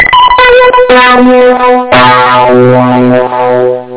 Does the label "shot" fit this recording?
No